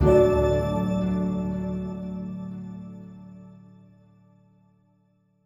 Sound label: Stingers Sting Gain